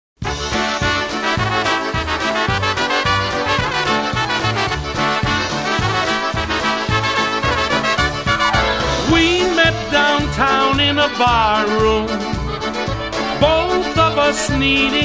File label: Polka